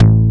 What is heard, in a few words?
RV BASS